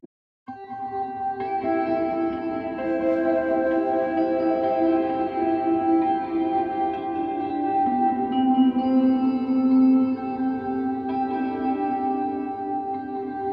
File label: Fairy Dream